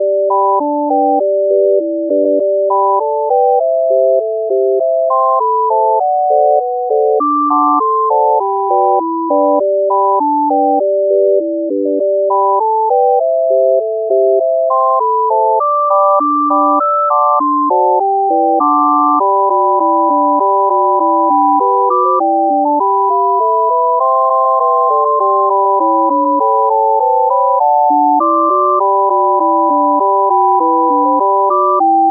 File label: bells